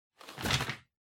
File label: Vacuum Cleaner Noise